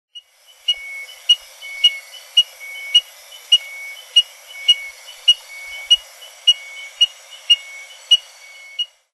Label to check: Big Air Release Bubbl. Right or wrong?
Wrong